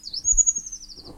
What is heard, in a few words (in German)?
baumlauf; waldnasen geraeuschesafari